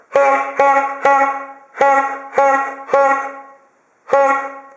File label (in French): son klaxon poire